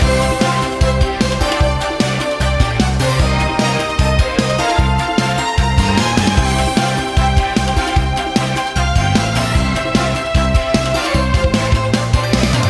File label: Invasion Loop; Jitsu Squad; ps4 ps5 switch windows xbox one xbox series xs; Ramen